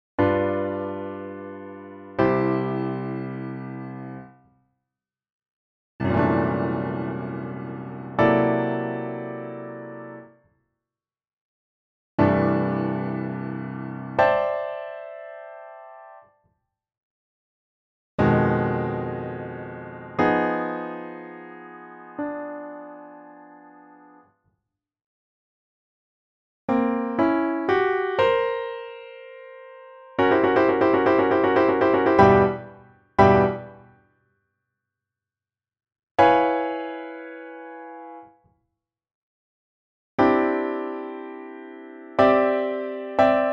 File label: Chords